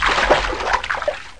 Water Splashs Little

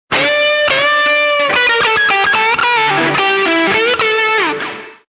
SOLO; MOBICLIC 83; CPLUSCLAIR

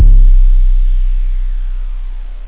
jungle; respect bass

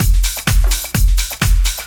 Full Loop, NY Dance; Sonic Foundry

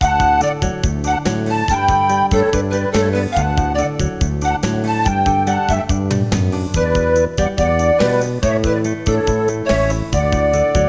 child; Microsoft MultiMedia Pack for Windows

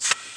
mouse; Bombardier

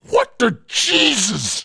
see death; Messiah

